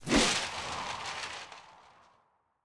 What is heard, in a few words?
envelopes; tft lny2023; asol